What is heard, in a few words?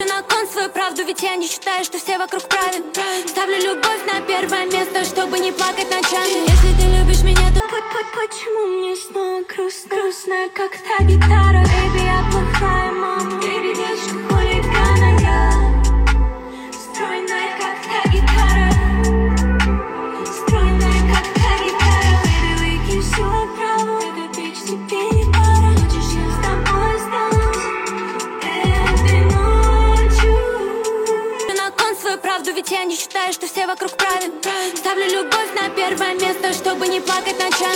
guitar